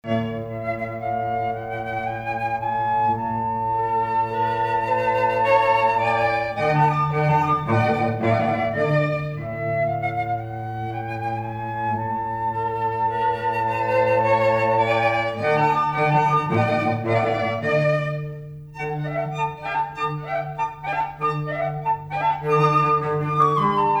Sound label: Flute, Violin, Cello